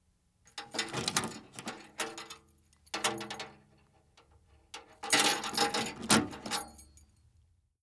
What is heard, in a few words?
Metal Locker; Fairlight Sound Lib